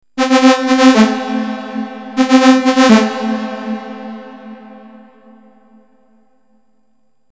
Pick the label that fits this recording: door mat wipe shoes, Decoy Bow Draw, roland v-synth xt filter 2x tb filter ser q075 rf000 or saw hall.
saw hall